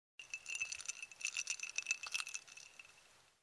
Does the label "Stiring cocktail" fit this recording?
Yes